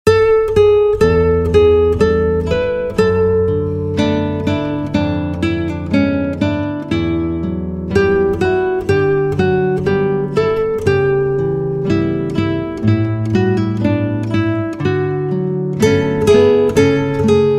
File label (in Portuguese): Vassourinhas para Trio de violoes